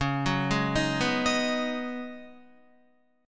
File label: Db 7th Suspended 2nd Suspended 4th Db Guitar; Db7sus2sus4; arpeggiated; tuning=4F,4A,5C,5G,5C,6E; 8,9,8,8,11,11